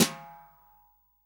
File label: SD, REAL DRUMS, SWING KIT; Best Service ProSamples vol.43; Real Drum Kits